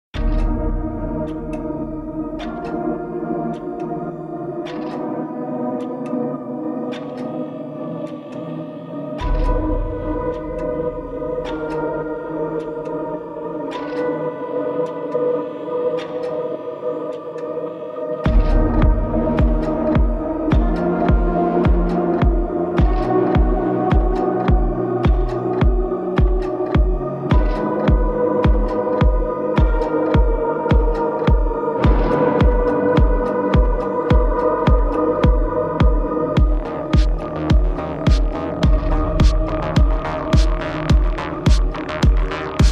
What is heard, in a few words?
Remix; Escape Room